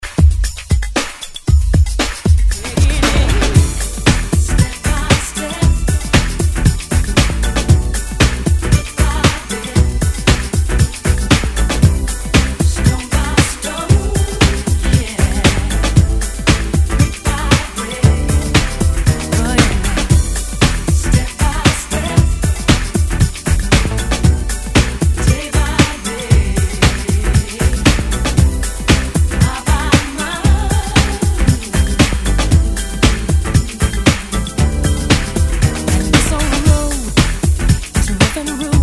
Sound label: 90s ReDrum 116 Bpm